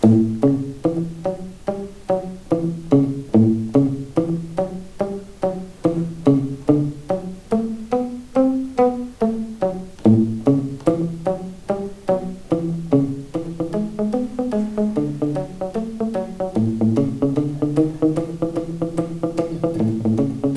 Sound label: delay